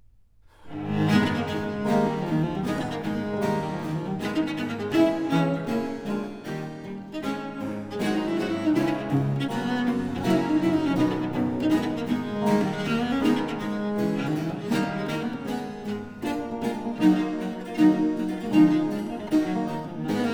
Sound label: Sonata for cello & continuo in A minor, Allegro; Vivaldi; Cello Sonatas